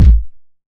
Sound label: subby kick drum sample a key, kick drum sample tuned to the A note; kick drum samples